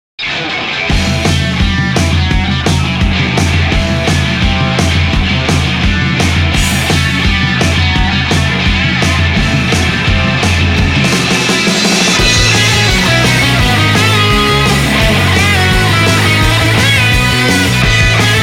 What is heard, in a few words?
Fast Rock